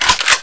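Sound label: hk416 silencer on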